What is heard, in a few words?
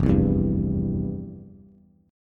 F Major 7th Suspended 2nd, F, down, Bass; strummed; JGuitar